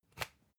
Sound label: usb port metallic out